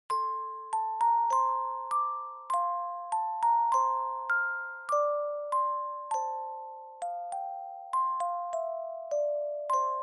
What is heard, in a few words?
Dry Fast